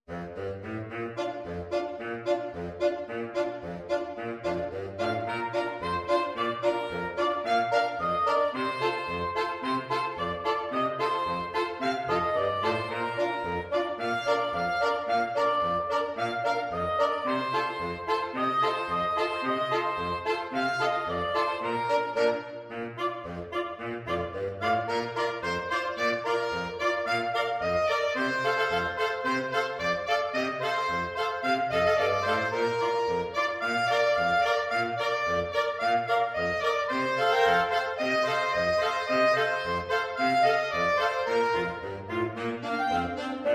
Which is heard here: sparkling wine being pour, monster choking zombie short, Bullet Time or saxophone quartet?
saxophone quartet